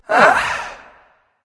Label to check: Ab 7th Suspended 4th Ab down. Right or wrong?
Wrong